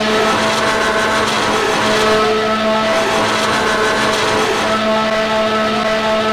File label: ex CMAX, F1; AOS F1 2022